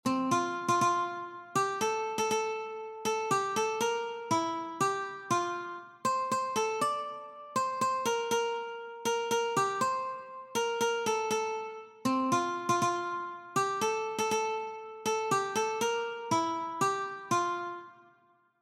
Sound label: O Christmas Tree Ukulele